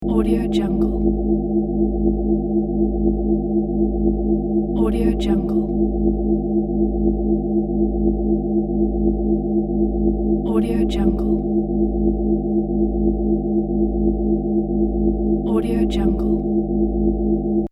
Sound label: futuristic hum loop